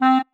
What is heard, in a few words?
marimba penguin; ゆめ2っき